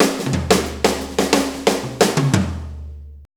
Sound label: 90 BREAK, DRUMS